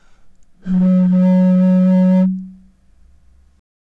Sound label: jug tone